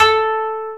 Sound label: KOTO A3, Asian Traditions; USB Soundscan vol.60 - Asian Traditions; AKAI